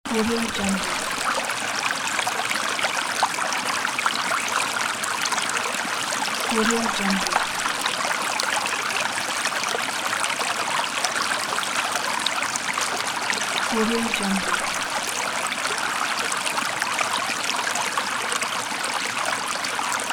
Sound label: small stream or creek; simplestock